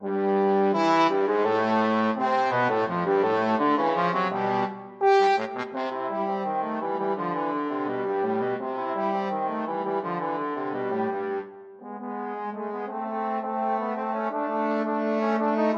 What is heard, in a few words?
trombone duet